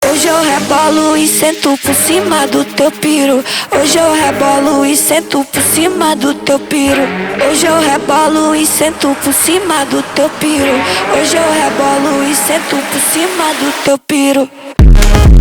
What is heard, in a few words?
country phonk, brazilian phonk